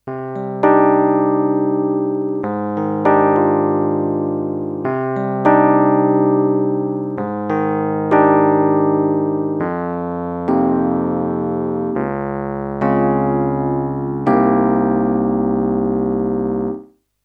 Alesis Prestige E-Piano

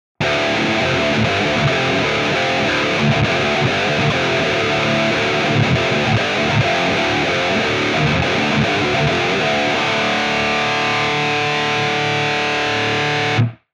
ESP SC 20 Bridge PU metal

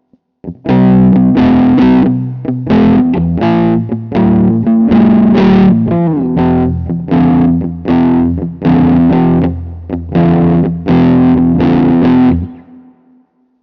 bluescrunch

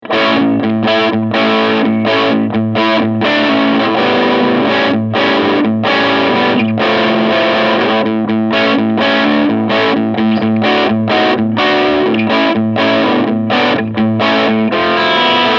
Supro Delta King 12 Combo Drive

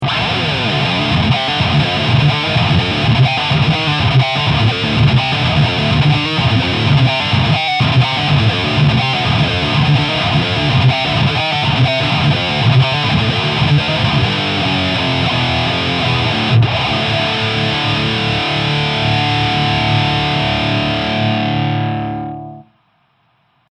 High Gain; Joyo Bantamp Zombie II

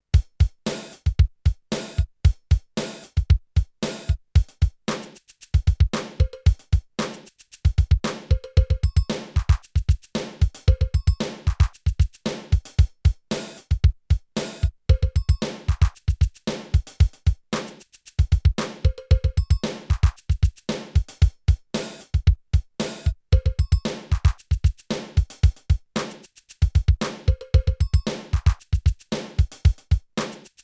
Roland TR 626 Preset Pattern

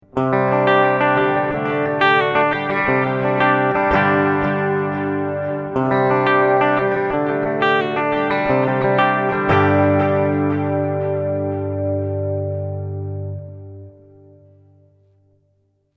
Digital Delay

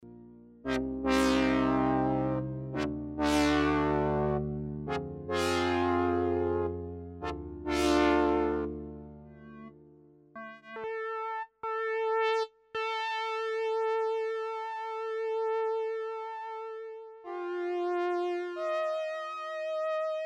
polysynth

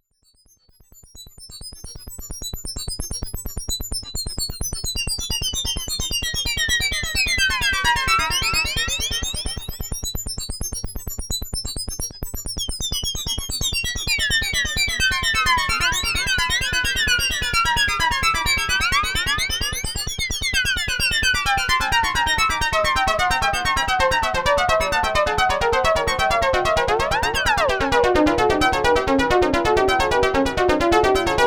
jup6 wide bending

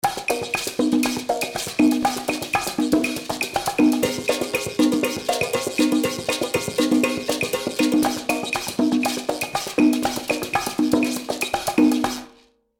DRP 20 percussion gated reverb

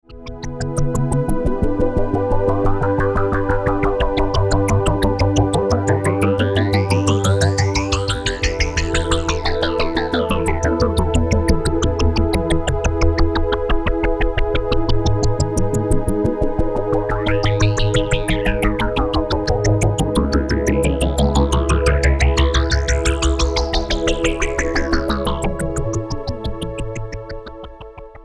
xpander mix

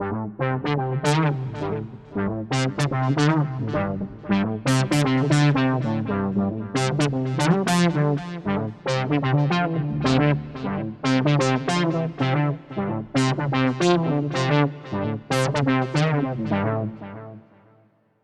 Boss SY 1000

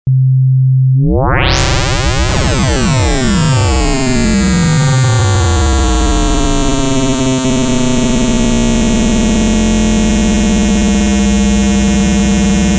Sequential Pro 2 Exponential Linear FM

